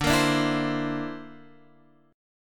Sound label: D 7th Suspended 2nd Sharp 5th